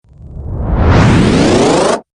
Charge Up Whoosh

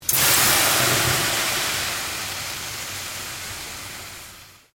Water Sizzling Very Hot Pan; Heated water sounds; Kitchen; Sound Effects:Household

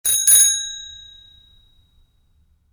Bicycle Bell, Single Ring; Bicycles; Vehicles; Bicycle Sound Effects

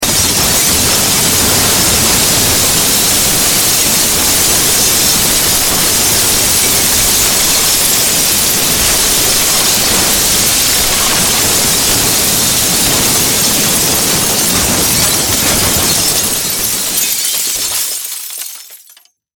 Impact Crash Mass Destruction Wood Glass; Crashes; Objects

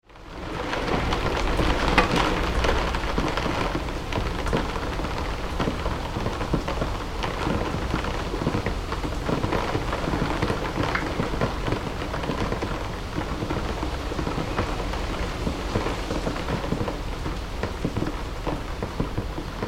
Rain onto Window Pain and Sill Interior; Various showers and dripping

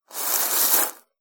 Packaging Paper Noises

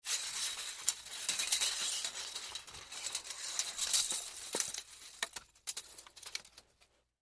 Tape Measure Move, Tape Measure Rustle; Hand Tools; INDUSTRY; Blastwave

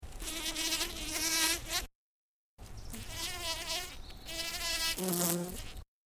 Bumble Bee Buzzing In Flower; bumblebee wings continually hitting flower petals; Bee Sound Effects

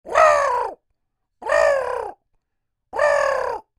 Creature Oodle Bird Calls Angry; Birds; Animals

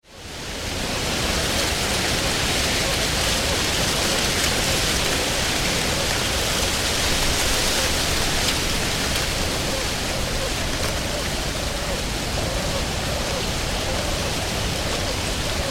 Wind in Dry Corn Field with background Bird; Dry corn blown and shaken by wind

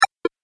Game Pause, video game

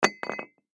Boat Hitch Drop Hit Cement; Foley; Switch Sound Effects; Objects; Blastwave

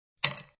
Ice Cb Thrw One, Ice cube thrown in glass.; Drink Mixing (Sound Effects:Bars)

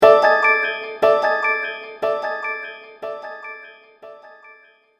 Magical Surprise Delay; Magic Sound Effects

